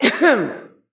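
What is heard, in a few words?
Prisoner Female Start Relax; Prison Architect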